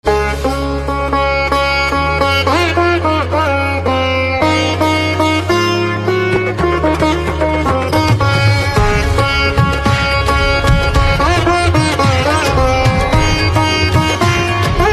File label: Veena